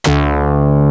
CZ BASS

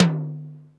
R8 TOM 1C

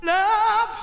VOC

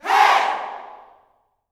"HEY", Gospel, YELL SHOUT; Spectrasonics; Vocal Planet Vol.1 Gospel; akai

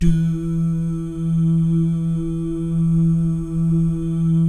"DOO" GUYS, Vocal Jazz Fx; Spectrasonics; Vocal Planet Vol.3 Jazz Fx

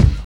BD

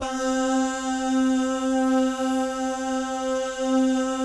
BAH GUY, Jazz

